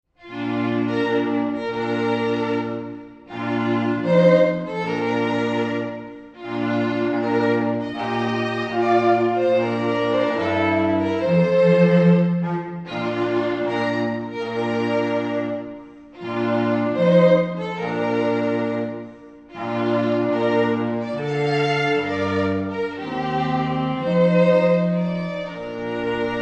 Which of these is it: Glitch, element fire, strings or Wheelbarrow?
strings